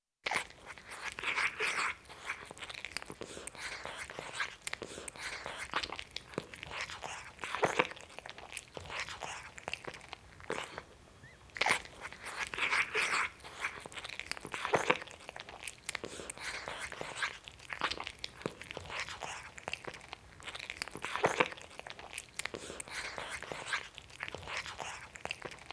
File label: Cat Guzzling Greedily; Cats; BBC Sound Effects Library